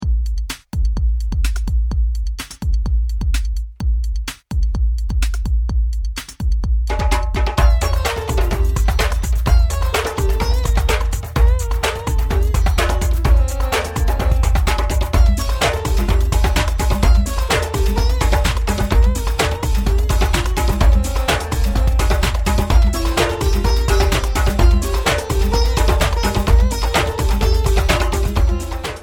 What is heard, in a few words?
world groove